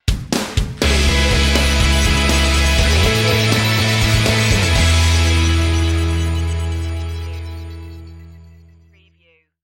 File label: flying solo stinger